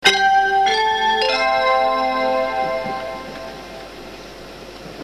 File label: train chime; はるか; ２８１系